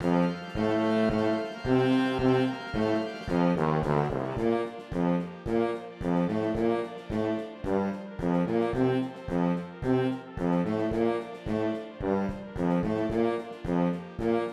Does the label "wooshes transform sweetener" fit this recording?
No